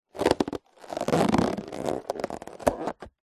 foley balloon or inflated surgical glove handling movements; zapsplat